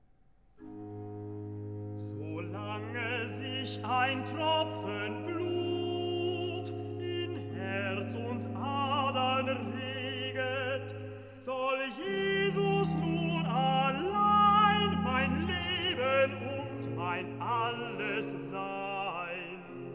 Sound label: Recitativo; Bach Johann Sebastian; Meinem Jesum Laß Ich Nicht, BWV 124; Kantate Epiphanias Plus 1